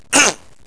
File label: throat; throat clear